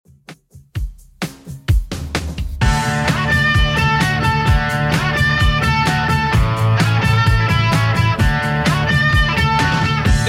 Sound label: 70s Redrum 108bpm